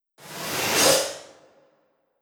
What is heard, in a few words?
Vampire Appear